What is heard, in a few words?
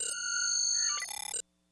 transporter startup beep; trek